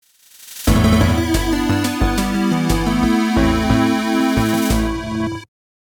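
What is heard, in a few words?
Game Over PSG version; The Adventure of Little Ralph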